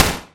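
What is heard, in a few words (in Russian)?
автоматизированный пулемет винтовка с одним выстрелом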